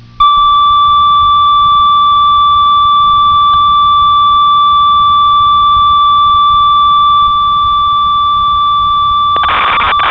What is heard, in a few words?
ob6, synth